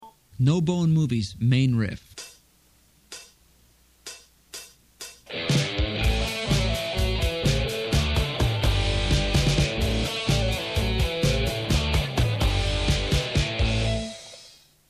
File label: main riff